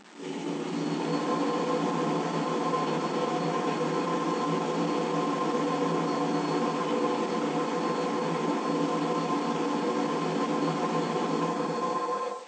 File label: pipes, tones; ambient; Counter Strike Model Pack; CS2